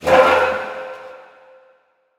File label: Chair Scuff; Prison Architect